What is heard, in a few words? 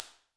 Basement